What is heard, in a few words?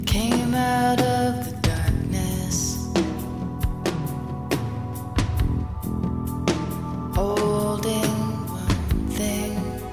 music; wu xing; earth